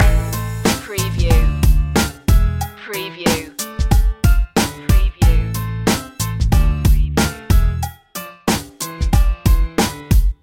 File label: hip hop from cuba loop